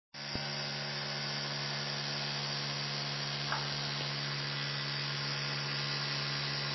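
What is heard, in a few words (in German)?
Elektrische Zahnbürste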